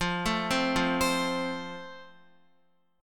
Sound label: F Major, Guitar; F arpeggiated; x,8,7,5,5,7